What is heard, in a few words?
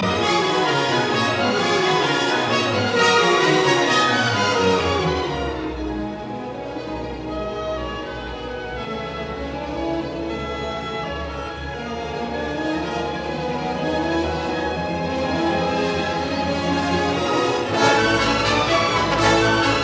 Symphony in C minor, Scherzo Allegro moderato; Symphony No 8